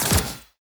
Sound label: basic Attack cast; heroes onagro